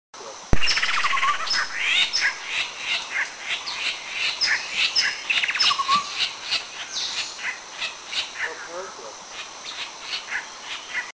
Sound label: White-hooded Babbler + Red-billed Scimitar Babbler, Eaglenest, India